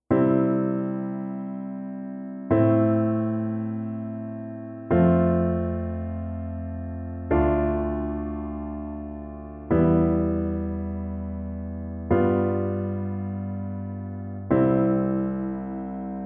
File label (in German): Kadenzen Erweitert Dominantparallele